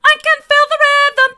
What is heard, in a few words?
i can feel the rhythm hard, vocal